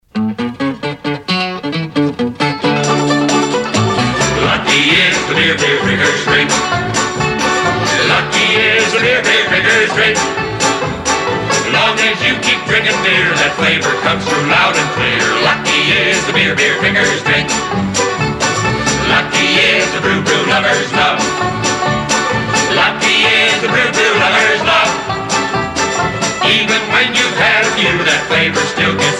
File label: Hootenanny Ad; Batton Barton Durstine and Osborn; Lucky Lager; Northwest